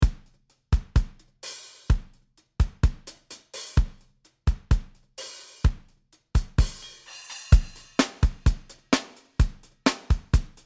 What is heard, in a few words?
Superior Drummer 3 Hitmaker Plate Delay Kit